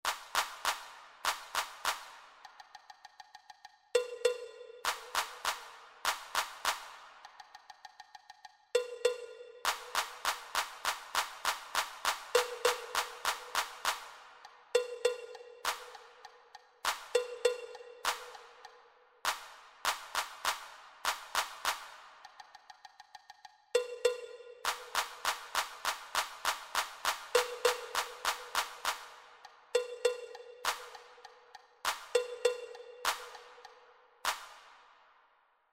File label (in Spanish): Ritmo 2 VOZ